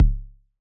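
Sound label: Bass Drum Analog Distortion; Yamaha DJX-2B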